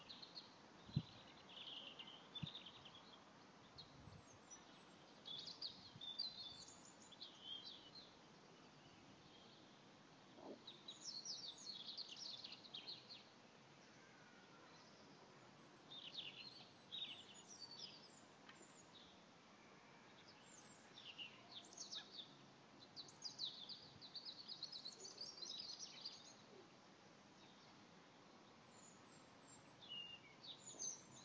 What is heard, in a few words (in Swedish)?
rodhake